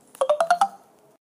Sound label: Join; Hangout